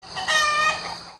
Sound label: chicken clock